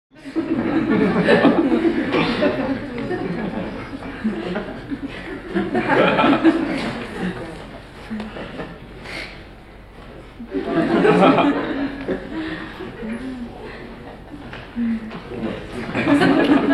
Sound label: Int Crowd Giggling; laughs small